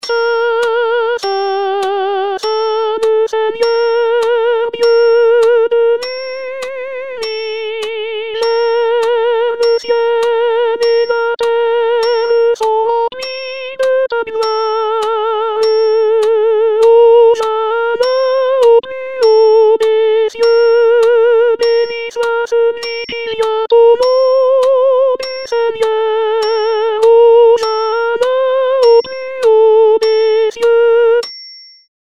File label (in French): Sanctus Soprano, Chorale; Paques